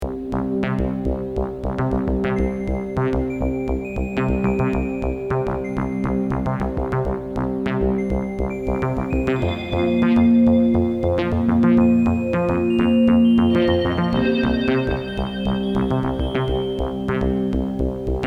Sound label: Casio; Tower of Casio Power